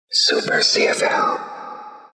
whisper flange; Quik

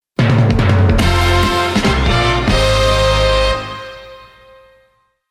Inst Fanfare Closer

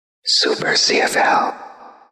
whisper; Quik

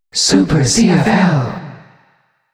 whisper double; Quik

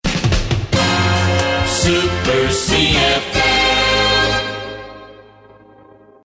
Drum Propellant; NewsBump

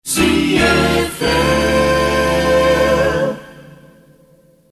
String; Slow

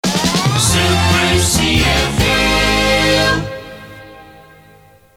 Shotgun Drum; NewsBump

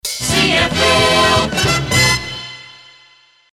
with Stinger UP; Shotgun

